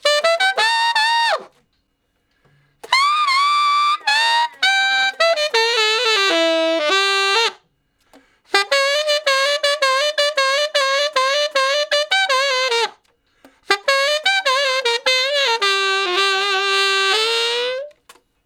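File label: Tenor Sax Shuffle (G); Solos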